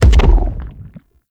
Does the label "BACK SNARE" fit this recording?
No